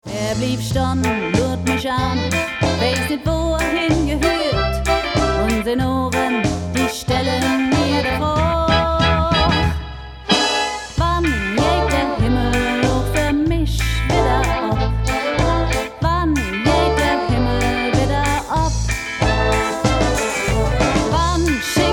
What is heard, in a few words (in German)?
musik probe konzert